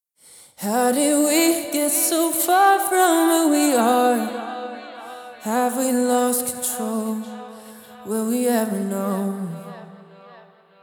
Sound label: Vocals Dreamy